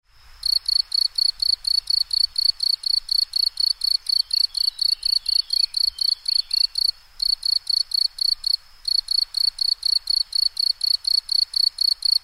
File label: bush cricket